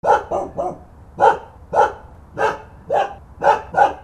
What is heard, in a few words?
DOGS